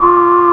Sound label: NOISE; AKAI S6000 CD-ROM - Volume 1; SAMPLE WAVE MIXING 2; NOISE&SE